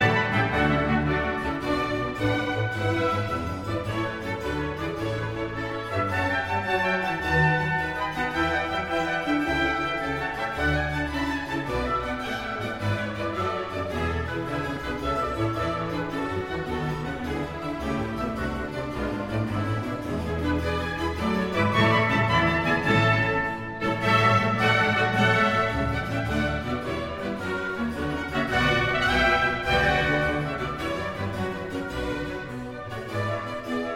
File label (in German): orchestersuite nr 3 d dur gigue